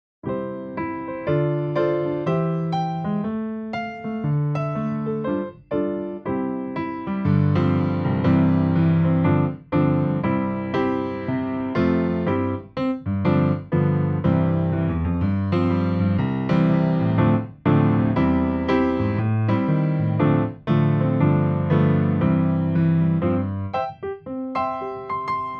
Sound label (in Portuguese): (Backing), playbacks musicas criancas; primeiros passos